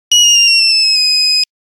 Nokia Buzzer Rocket; Windows Phone apps

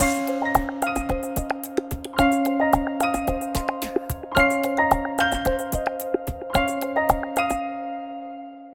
Lounge, ringtones; Asus Zenfone-3-Deluxe

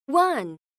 Key Tone; Samsung GT-E1172

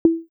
volume min

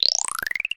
Gliss; Tecno; Pop 9 4G

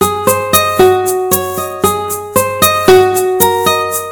music guitar, ringtones; Sony Ericsson A8i

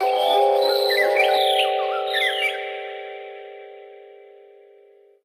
birds of paradise, notifications; Sony; Xperia Z3

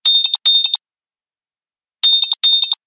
Sharp, ringtones; Motorola MOTOLUXE-XT615

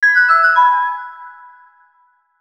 Alert; Tyrangel Alert; Sony Ericsson; PlusFourSix; Tyra (G900)

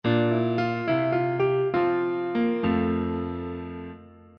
Hour Indication; LG; BL20-New-Chocolate

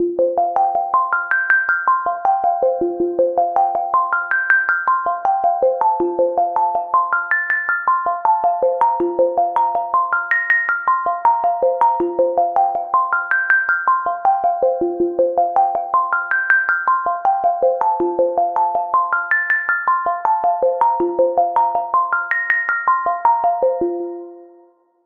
ringer rounded

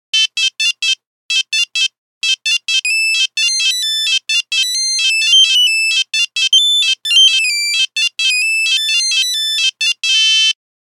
Nokia Buzzer Hurdy-gurdy; Windows Phone apps

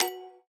camera video stop; Amazon Fire Phone

